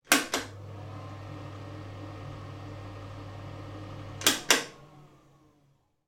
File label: household bathroom or toilet pull cord light switch on then extractor fan runs followed by